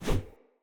Short Sword Melee Whoosh Fill; Enemy; Weapons; Short Sword Elite

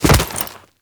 Finisher Grab; Kuva Lich; Warframe

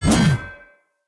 Two Handed Mace Whoosh Heavy C; Weapons; Enemy; Blunt; Sinecure Two Handed Mace

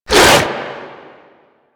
Proto Infested Runner Arm Attack; Warframe

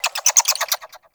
Bird Clicky; Warframe; Eidolon Plains; Ambience

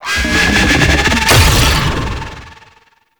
Un Healing Start; Octopede; Warframe; ManInTheWall

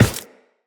Run Glass, Footsteps; Tenno

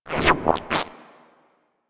Mine Osprey Attack, Dialog

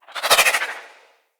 Arch Wing Dodge Roll Left; Vehicles; Movement

